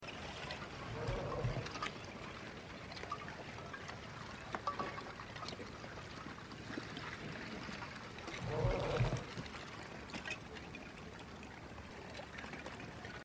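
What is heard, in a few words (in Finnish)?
jääkarhu jäälautat; jaaakarhu; katoava pohjola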